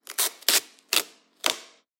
taping; soundscrate

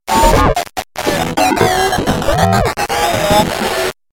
computer glitch

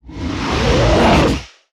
animal monster

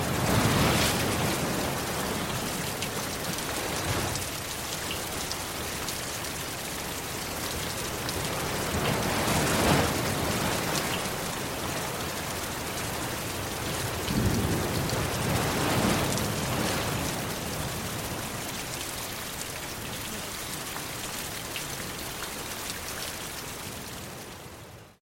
rain and wind; soundscrate

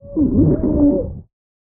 underwater scream female; soundscrate

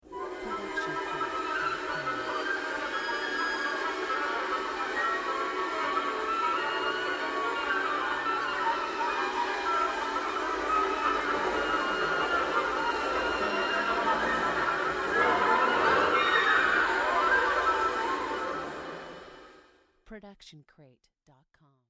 woodwind noise

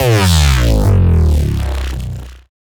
Bass Drop; SoundsCrate

